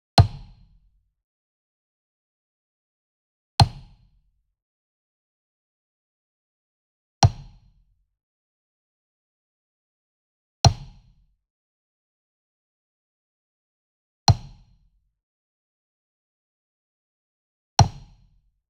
Wood Percussion Wood Block Traditional Small Resonant Hit Near; boom library; Cinematic Strikes Construction Kit